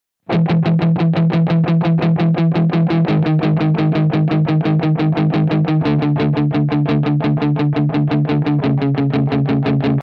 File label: CRUNCH, guitar